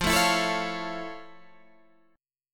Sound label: F Augmented Major 7th F Guitar Open G